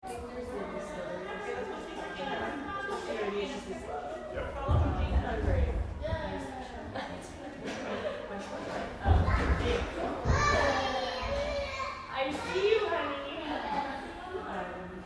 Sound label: Crowd Kids Gymnastics Lite 2 Parents Talk; Miscellaneous Sport